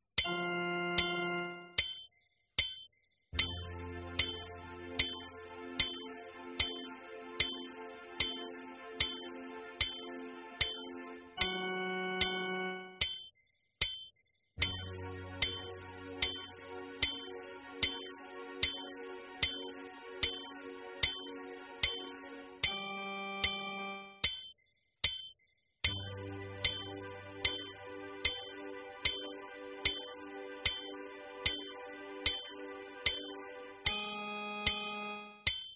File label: warm up exercises; Playalongs zu den WARM-UP EXERCISES; otmars warm up exercises